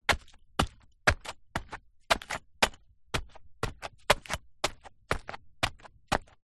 footsteps bricked ground walking; foley; zapsplat

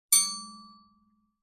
metal ping strike resonate; impacts

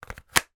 industrial drill cordless battery clip on

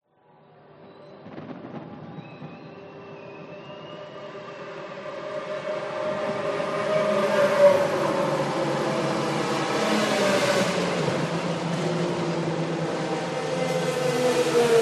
sport f1 cars pass fireworks distance singapore

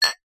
household glasses drinking x2 clink together; zapsplat

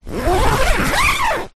tent zipper; foley

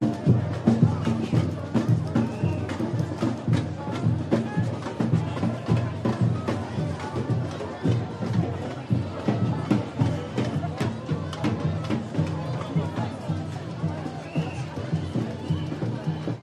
atmos protest band approaching ethnic; london music mixing

